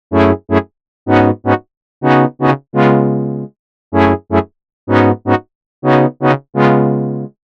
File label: Synth Brass